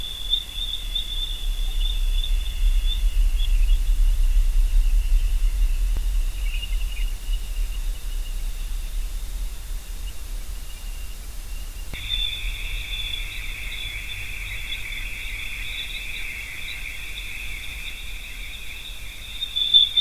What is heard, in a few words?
WFR audio emfisis